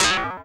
FX EFF, ANALOG; Sound & Vision - Gigapack II

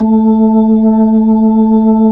B-3 Jazz A4; Keyboards of The 60's and 70's

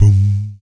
VOC PERC, Vocal Planet Jazz FX BASS PERC

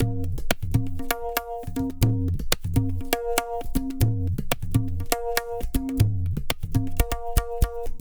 Pure Tabla, 120BPM; Zero-G

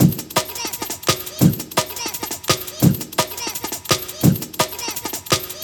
Break, Drum & Bass, Beats & Breaks

